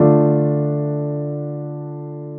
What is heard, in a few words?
chord Dm